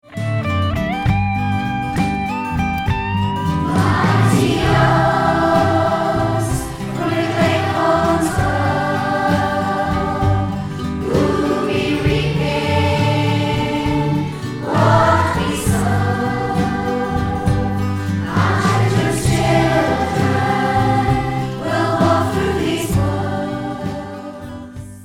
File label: WOODLAND SONG